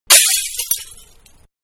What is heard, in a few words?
Window Breaking; Windows; Household; Manhattan Production Music